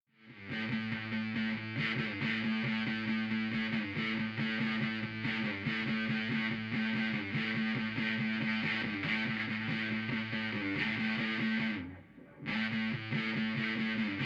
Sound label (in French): guitares avec; bxconsoleE